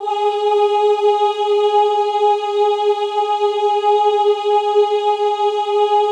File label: OOH G3 L, Extended Classical Choir; Best Service; OOH MIX